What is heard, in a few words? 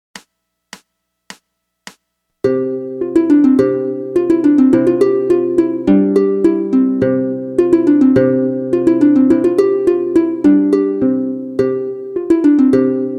harp; Bach Muzette